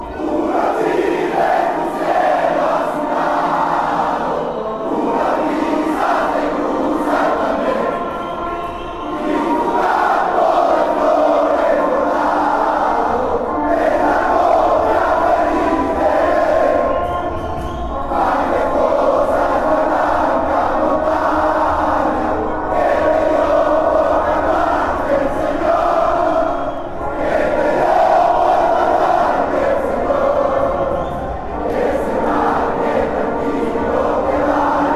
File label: chile national anthem